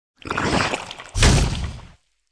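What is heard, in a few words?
mutant fish attack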